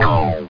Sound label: LAUNCH